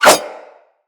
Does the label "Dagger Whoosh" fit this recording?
Yes